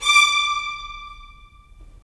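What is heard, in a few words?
strings violins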